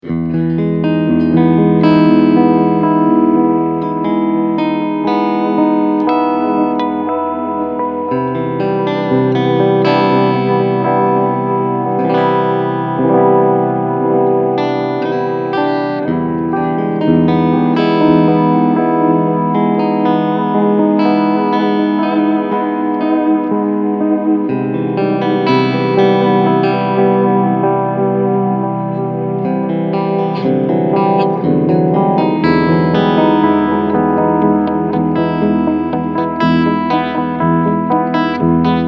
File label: maximum delay time; 4tel